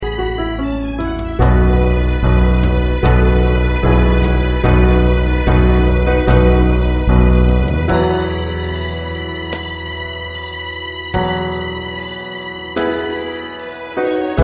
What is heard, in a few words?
Accompaniment